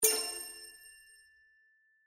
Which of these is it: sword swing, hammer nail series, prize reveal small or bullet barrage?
prize reveal small